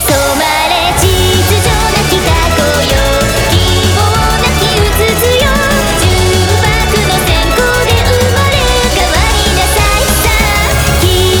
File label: BGM Reach